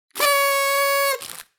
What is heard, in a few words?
party